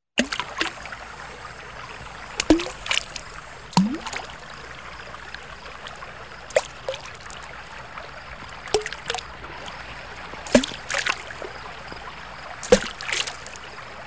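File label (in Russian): маленький камень падает в реку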